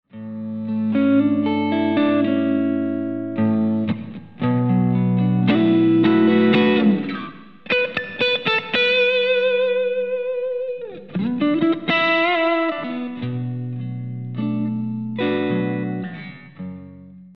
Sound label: BD2 Clean